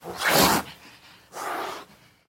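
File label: sounds cat hissing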